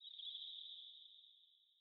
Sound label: cave insect trill; Rust